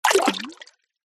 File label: multimedia game designed water splash